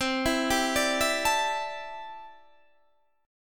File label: D 7th Suspended 2nd Suspended 4th C